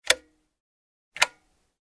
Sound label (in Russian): knopka vykljuchatelja